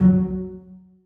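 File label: basses pizzicato f#3; Sonatina Symphonic Orchestra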